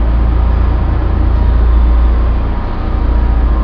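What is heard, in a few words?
ambience refinery loop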